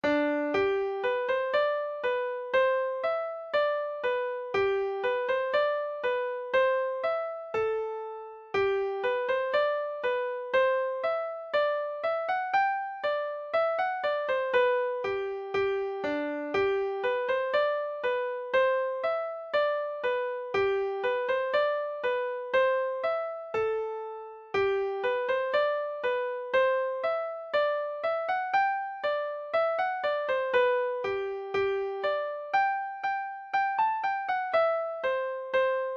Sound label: hornpipe; shepton mallet